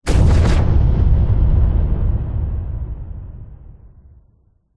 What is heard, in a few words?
explosion big heavy dynomite; zapsplat